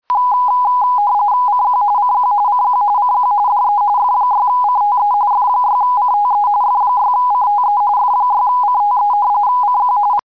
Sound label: RTTY 45